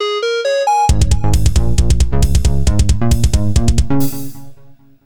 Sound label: Hero Item Get; tallowmere gamerip 2014